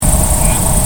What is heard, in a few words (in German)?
Purpurreiher